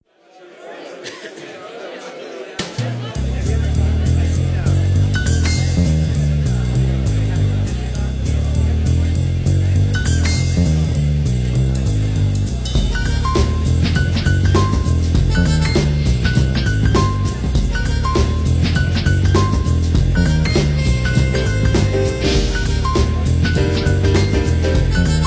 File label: Jazzy piano; Nokia N73